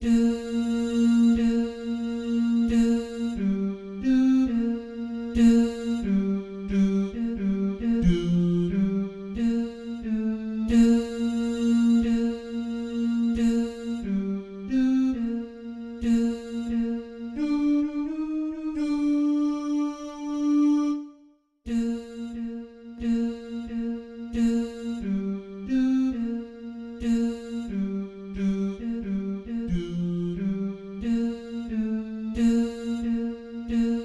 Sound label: tenor; All Night All Day